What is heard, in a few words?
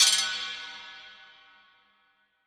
METAL DROP, Impacts, Hits & Booms; Distored Reality